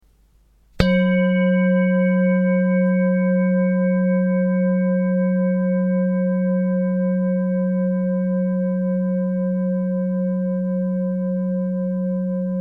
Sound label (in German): Klangschale